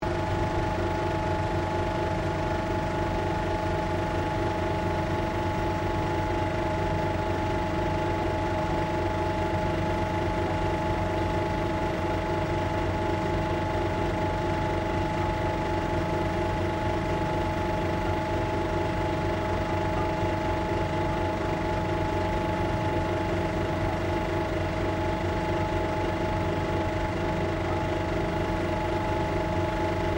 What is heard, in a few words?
PROJECTOR CINEMA 35MM OPERATING CLOSE REAR LOOP, Modern Cinema projector; Projectors